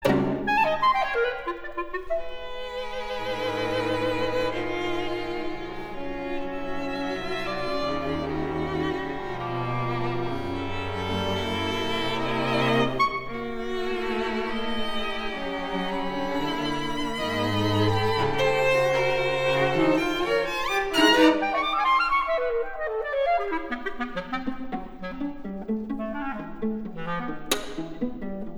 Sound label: clarinet quintet